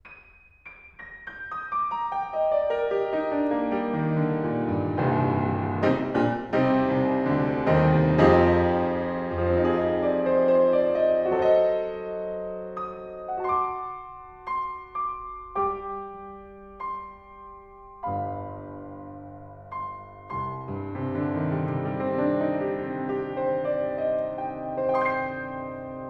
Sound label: Piano, Vert 195cm Mic